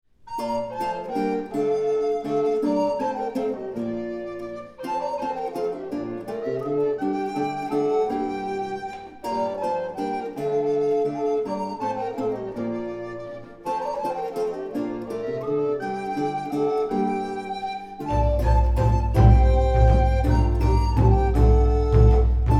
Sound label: basse dance